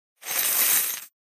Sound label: get gold; handredcattle